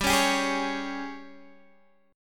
Ab Major 7th Suspended 4th G

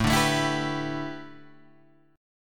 A 9th Suspended 4th, Guitar Open G; 7,7,7,9,8,9

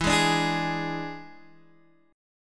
E 7th E down Guitar Open D

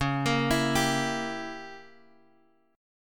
C sharp Diminished 7th C sharp down Guitar DADGAD

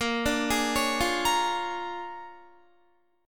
Bb 7th Sharp 9th Bb Guitar, chord; 4E,4A,5D,5G,5C,6F; Bb7#9 arpeggiated; JGuitar

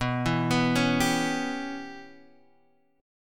C sharp Minor 7th Flat 5th B

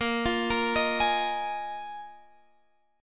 A sharp 7th Suspended 4th, Guitar, Drop D; 20,20,20,20,21,x

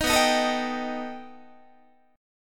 B Augmented 7th B down Guitar; B+7; strummed; 18,19,18,19,19,18; 4A,4E,5A,5D,5G#,6C#; JGuitar

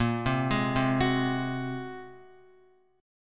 Bb 7th Suspended 2nd Sharp 5th Bb, Guitar; Bb7sus2#5; arpeggiated; x,1,0,1,0,2; JGuitar

